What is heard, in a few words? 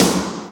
Snares; Vengeance Samples; VEH2